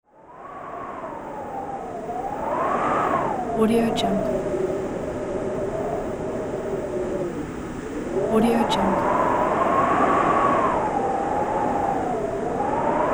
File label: winter snow storm; صدای طوفان و برف زمستا; nature sounds